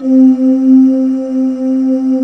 C3, Choir Acoustic & Synth; USB Soundscan vol.28 - Choir Acoustic & Synth; AKAI

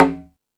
Latin Drums, E-MU Pro-Cussion; E-MU Pro-Cussion-1

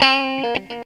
GTR, Funky Elements GTR RIFFS; Zero-G

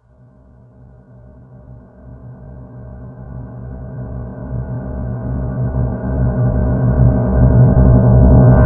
Underneath Swell; KJ Sawka - Drum n Bass & Beyond; Swells

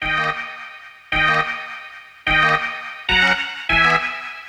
String Hits; Nuclear Sub

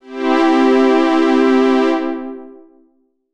Ob String Chord, Old Skool House; Big Fish Audio; OSH Chords

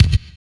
KIK FX, Drums; AMG; Now CD-ROM (Roland)

